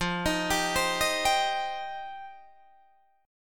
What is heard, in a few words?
F 7th Suspended 2nd F Guitar DADGAD; F7sus2; arpeggiated